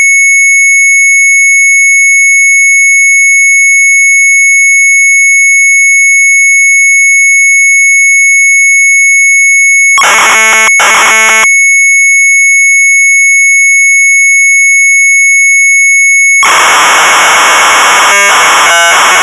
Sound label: MZ800 Tape, sharp; BREEK EEN EI; retromelsarchive